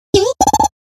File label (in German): Ruf